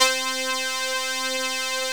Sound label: Roland JX3 THIN